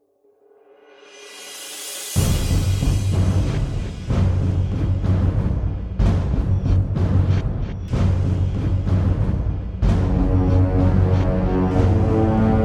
MAINTHEME SINISTER; Stalker 2

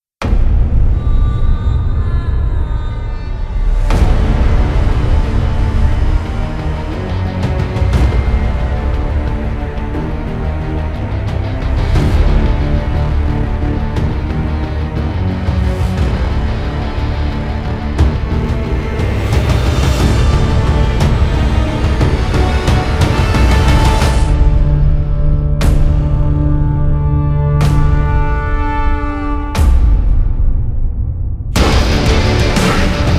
Trailer Orchestral; Total War Attila

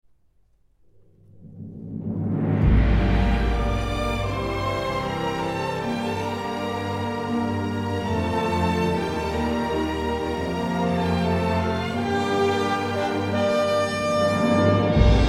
Fantasy Maintheme Orchestra; Dragons Prophet

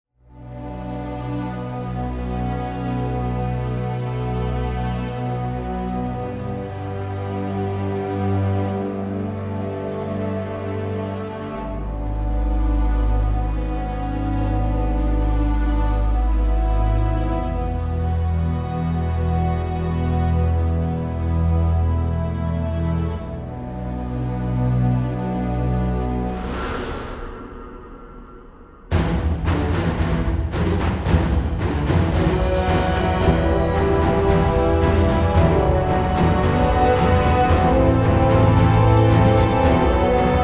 Cinematic Orchestral Hybrid; MKX

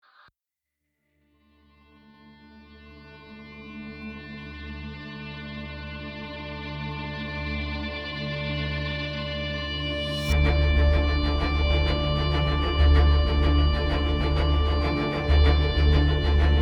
Trailer Hybrid

